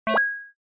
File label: Accent